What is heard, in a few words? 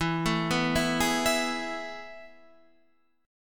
A Major 7th Suspended 2nd E